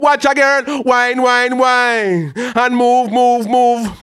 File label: RAGGA MUFF, B maj; Sound & Vision - Gigapack II